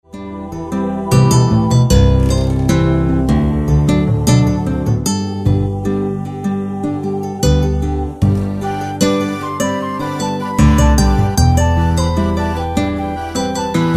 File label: hawaiian guitar